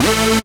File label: DR. LOOP, BUZZ; X-Static Goldmine 3